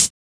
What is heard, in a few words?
Closed Hats